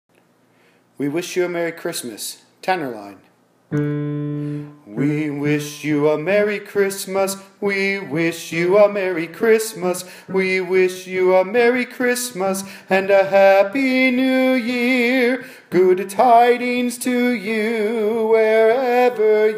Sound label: tenor